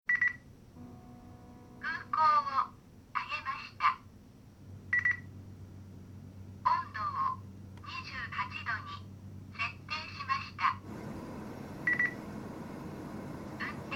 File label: aircon speech